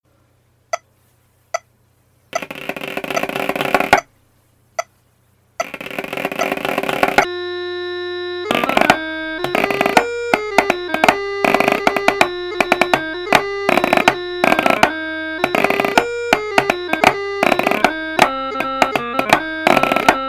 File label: Chanter Snare